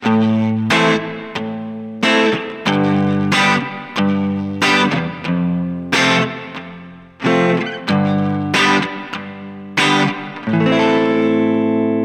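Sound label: clean full, guitar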